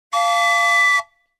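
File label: whistle blast, smeshnye mulitiashnye zvuki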